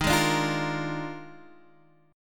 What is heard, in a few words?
Eb Major 7th Suspended 2nd Suspended 4th, Guitar DADGAD; x,6,6,7,8,8; down